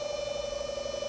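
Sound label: camera move loop; movers objects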